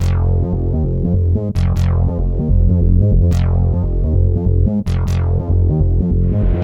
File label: Hardcore Breakbeat, 145 Fmin